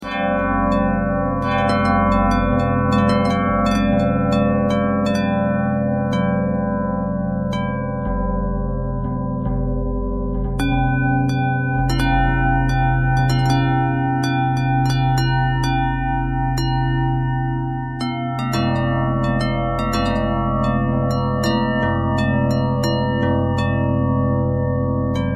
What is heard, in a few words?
bell bendtwist; bells